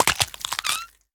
幼年海龟破壳声

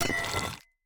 斧除蜡声

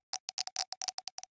玄武岩三角洲咔嗒声; ambient

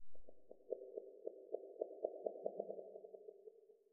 受伤传导声; 嘎枝之心

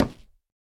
下界木破坏声

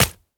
三叉戟穿刺声

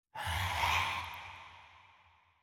灵魂疾行声

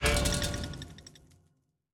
试炼刷怪笼检测玩家声

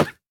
犰狳受伤减免声

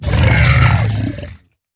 僵尸疣猪兽死亡声

